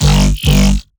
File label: Dirty Bass G 140, Bass Hits; One-Shots; Spectrum; Ghosthack; Color Bass Essentials